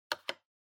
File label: PC-Keyboard (Space-Mid)